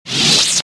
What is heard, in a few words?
saber off quick; weapons